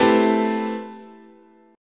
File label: A Minor 9th, chord, guitar